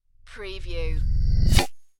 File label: Magnet Attract; sound-effects-bible